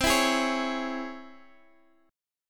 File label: C sharp Minor Major 7th, chord